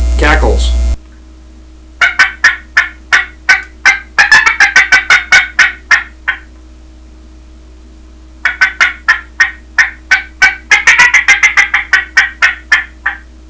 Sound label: dia cackles, turkey; dixie